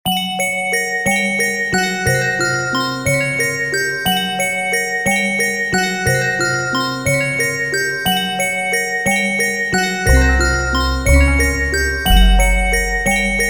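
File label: intro - plate reverb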